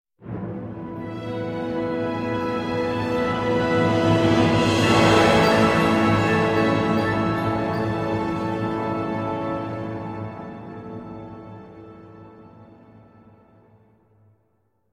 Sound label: Success